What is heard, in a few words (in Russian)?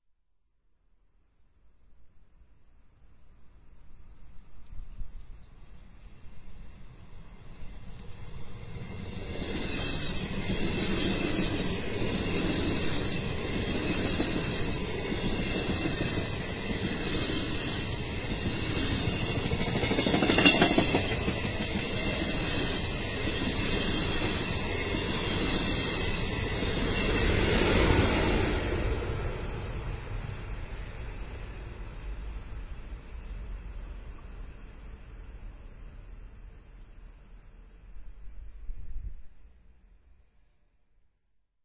3D Poezd; 3D звук , слушать только в наушниках (иначе эффекта ни будет ) , желательно в компьютерных